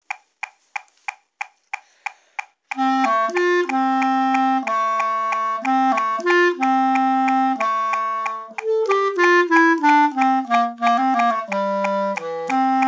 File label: fast